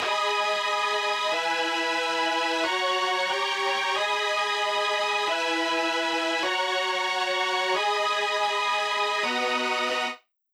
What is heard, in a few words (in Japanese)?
Chord Pad Super Saw, Vital, いい感じにゴージャスですね～それでいて優しい。; コードシンセ：Vital; VitalSuperSaw; SuperSawと名付けていながら矩形波も鳴っています。